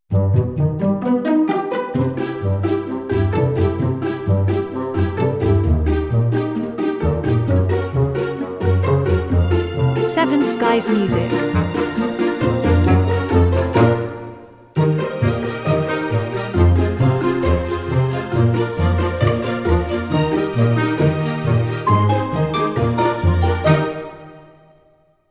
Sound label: Comical Adventures